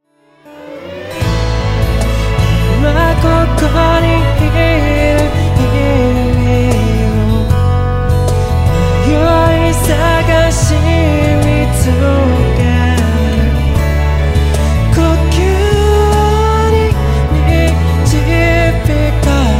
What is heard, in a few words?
metronome